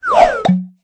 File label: Sidekick Collect